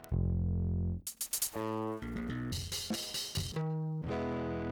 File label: Saturator; Virtual Analog